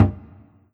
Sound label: Mute Surdo; Korg N1R